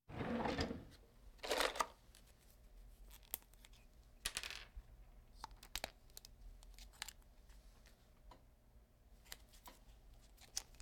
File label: Hospital effects